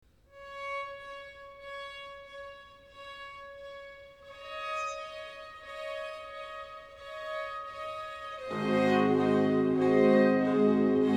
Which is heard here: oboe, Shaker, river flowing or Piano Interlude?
oboe